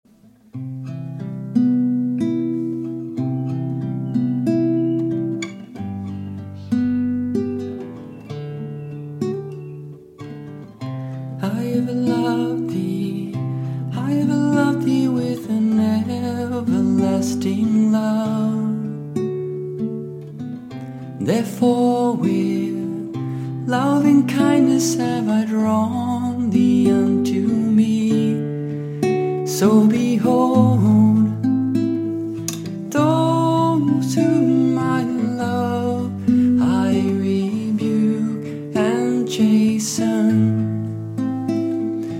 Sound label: Father's Lullaby